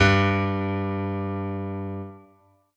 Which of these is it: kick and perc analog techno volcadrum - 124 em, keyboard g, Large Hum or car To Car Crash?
keyboard g